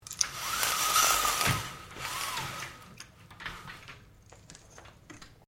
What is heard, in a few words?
Blinds Venetian Raise Slowly, Household blinds; Living Room Sound Effects; Living Room (Sound Effects:Household)